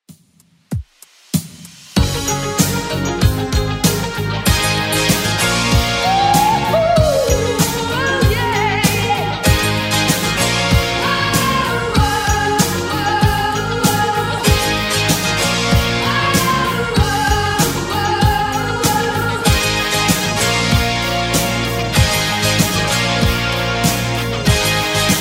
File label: 80s redrum 96 bpm